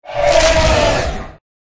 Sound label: Bike